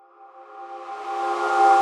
Transition FX - F Min; Cymatics; Orchid Sample Pack

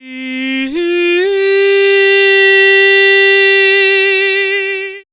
Main Vocals Outro Cm 70bpm; Construction Kits; Ghosthack; SkyRealm; Kit 19 - Ode To Daenerys

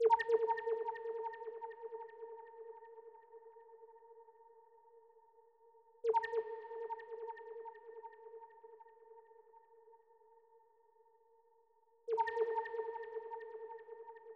159 BPM D# Min Glitch; Melody; Loop Stems; Cymatics; 2022 Melody Collection Platinum Expansion; Sky Blue

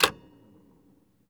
Gear Foley - Reel to Reel Switch; FX; Cymatics; Eternity Sample Pack

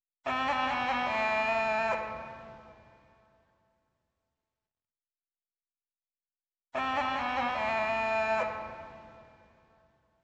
148 BPM A Min Gaida; Cymatics - 2022 Melody USB Expansion; Blue Pt 1

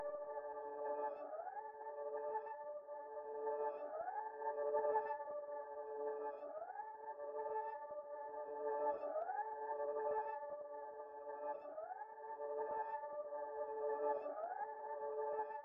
92 BPM D Min Melody Texture, loop stems; Cymatics; 2022 Melody Collection Platinum Expansion - Pt 2; Top Floor

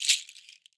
Ekpiri Shaker; World Percussion; Cymatics; Emperor World Percussion

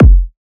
Kick, Techno, One-Shots, Drums

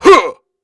Grunts and Chants, Viking Vocal

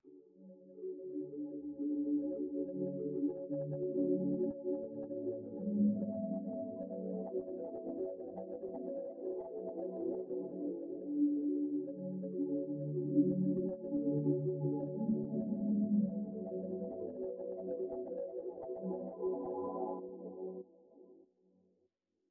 96 BPM F# Min Pad; Melody; RnB; Loop Stems; Cymatics; 2022 Melody Collection - RnB Pt 1; Break Away

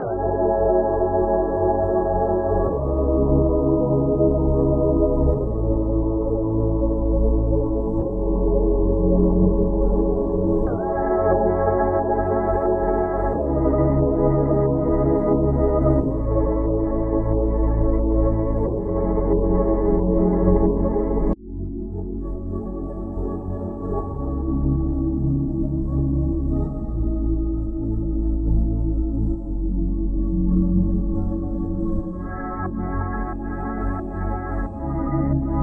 90 BPM C# Min, Vintage & RnB Melodies; Violet; Cymatics

